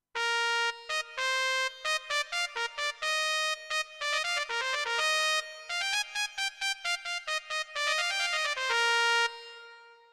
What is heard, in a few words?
Trumpet